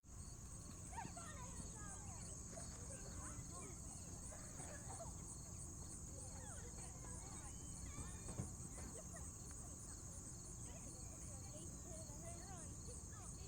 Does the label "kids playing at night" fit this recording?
Yes